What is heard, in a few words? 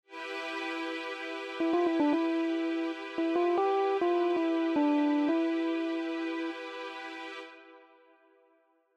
e phrygian mode